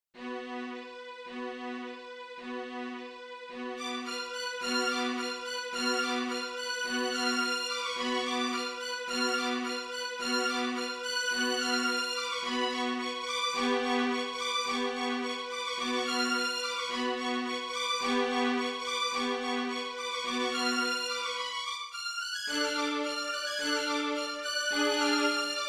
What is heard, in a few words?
Bartok Dances No3, Six Romanian Folkdances; (includes optional piccolo part); No.3 "Der Stampfer"; from Six Romanian Folkdances - Bartok